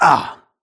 grunt hits; Titanfall 2

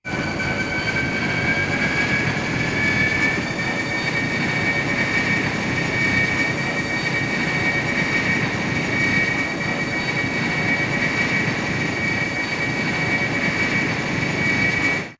evac hoverext; crow; Titanfall 2

